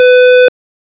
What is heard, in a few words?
short 2 tone negative ui, beep